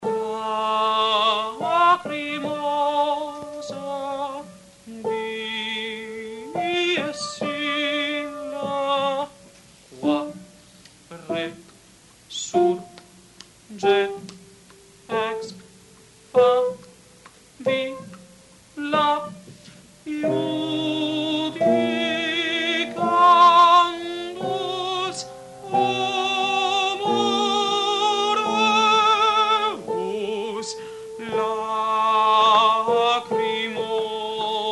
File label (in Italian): Lacrimosa soprani; Mozart